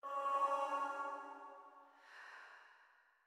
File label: Chime; chime sharp